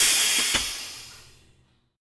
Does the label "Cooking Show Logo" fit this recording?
No